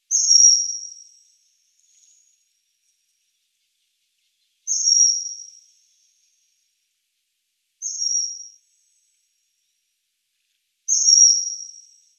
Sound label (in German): Eisvogel Alcedo atthis